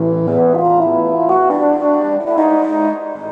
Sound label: harmonic riff; K.T. (synshak); Sonic Foundry (Sony Creative Software); Ugly Remnants VOL-2; Knutrix Demo